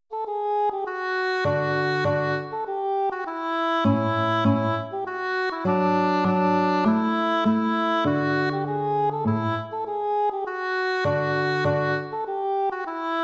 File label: andante grazioso; Ferdinando Carulli